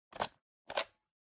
Floppy Disk Drive Eject

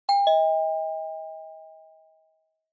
Doorbell Ding Dong

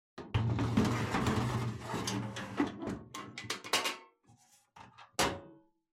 Kerosene Fan Heater Fuel Tank In

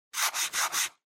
Eraser Rub

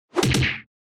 Hit

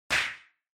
Hand Synth Clap

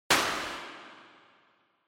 Party Popper Reverb

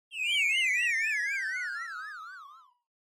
Negative Slow; Onoma

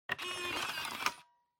Optical Disk Drive Eject

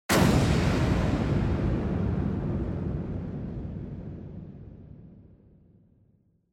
Impact Long; Onoma

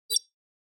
Cyber

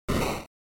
Noise Phrase, NES; NES-General

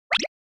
Motion Pop

